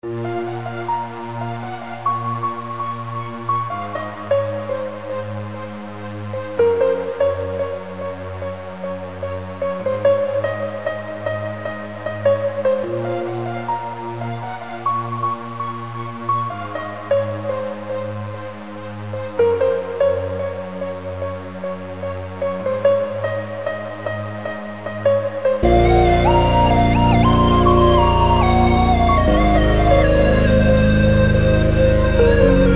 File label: Trance Dance Classical Melody